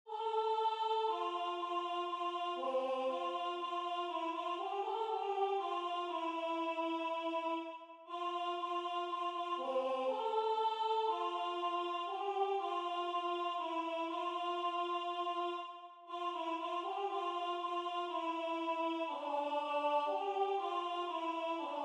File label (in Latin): Cantate Domino Alto